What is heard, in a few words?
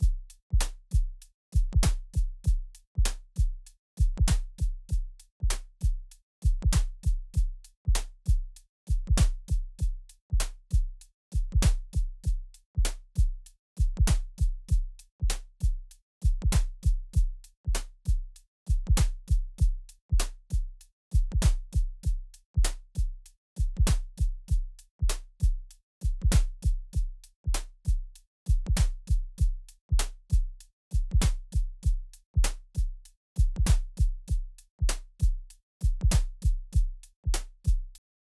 Eventide Drum Omnipressor negative Function